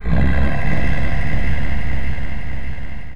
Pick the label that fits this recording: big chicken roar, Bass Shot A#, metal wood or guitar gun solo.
big chicken roar